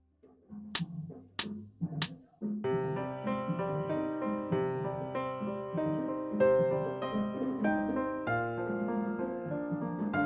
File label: Prelude Full Tempo with Solo, Flute